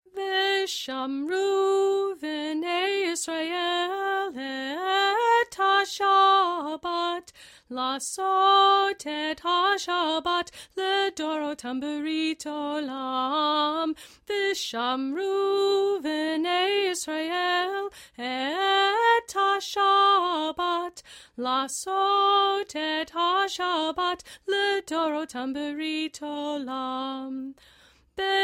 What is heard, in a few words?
V'shamru Chanted; bnai mitzvah study guide friday evening